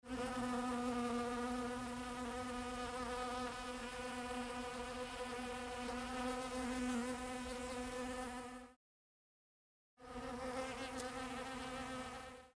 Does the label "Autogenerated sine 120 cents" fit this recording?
No